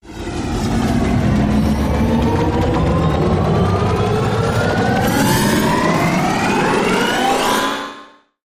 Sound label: Big Hollow Pulse Sweep